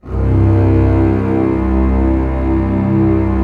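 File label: cellos, forte, orchestra; Roland LCDP13; STR Orch. f Slow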